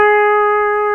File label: PEDAL STEEL